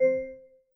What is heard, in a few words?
Class Harp